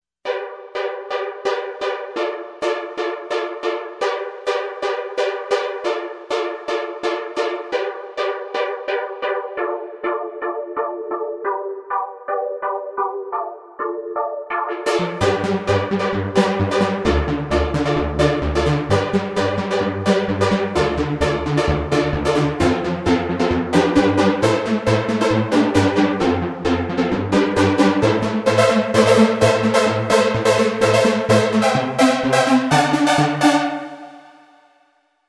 Trance Era, Roland V-Synth; Factory Patches

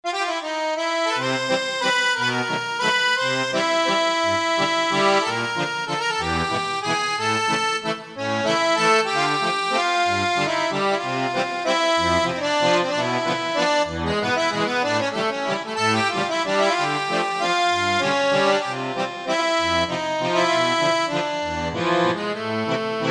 Accordian, Roland SR-JV80-01 Pop

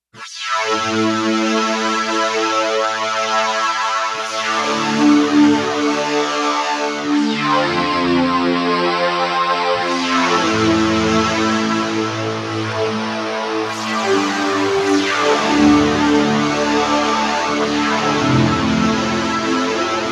GTRs; Digital Synthesizer

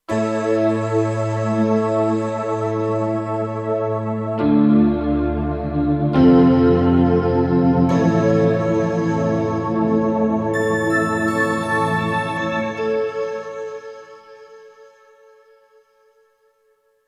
Kurzweil K2500X Passion Pad

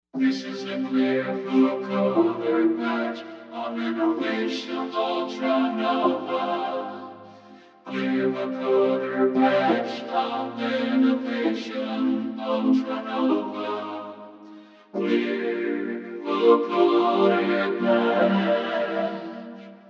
Klear Vocoder; Novation UltraNova; Factory Patches

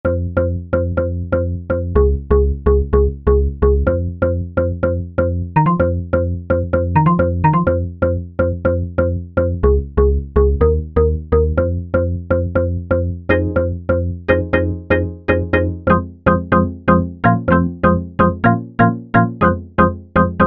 Valve 5th Bass; Roland SRX-07 Ultimate Keys

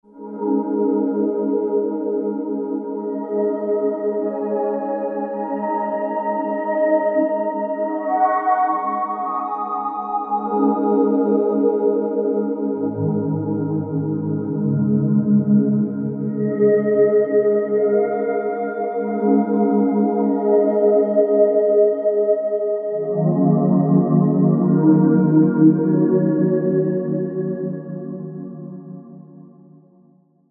Ethereal; Roland SRX-07 Ultimate Keys

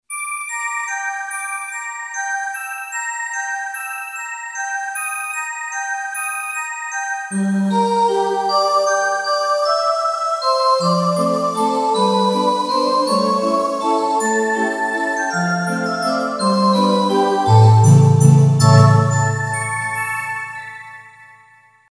Fantasia, Yamaha PSR-550 Voice

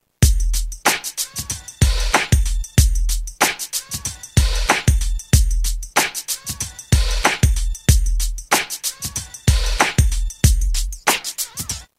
Party Jam 94; Roland M-DC1

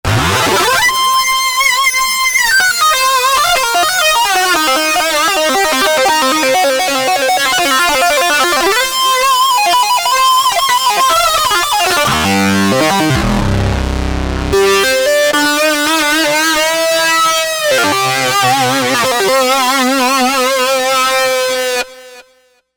Mini Lead

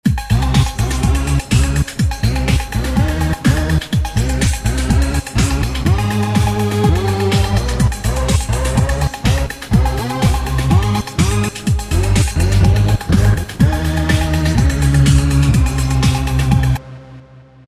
Dance Split; Roland SR-JV80-06

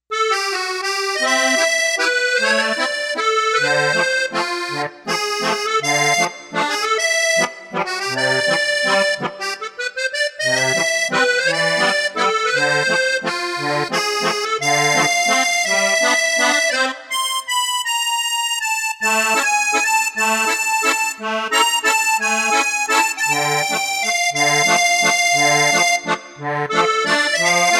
Or Musette

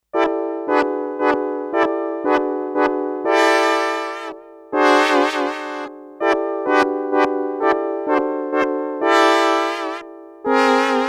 brass, Sequential Circuits Six-Trak; 51 Grok brass; Factory Programs

